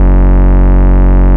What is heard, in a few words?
Ultimate Bass Root F#; Vengeance Samples